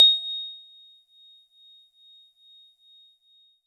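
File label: Pristine Celeste DX100 A#6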